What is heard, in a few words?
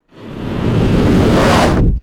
cinematic reverse whoosh and impact